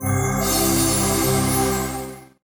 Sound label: free spin start; Amatic; Wolf Moon